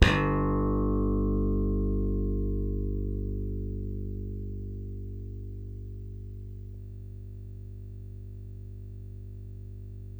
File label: SLAP BASS, Electric Basses; PlatinumPhattCD2; E-MU Producer Series Vol. 8 – Platinum Phatt